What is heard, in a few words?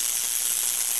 electro fly, weapons